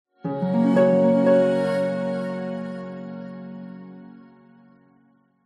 multimedia musical branding intro harp soft choir ascending positive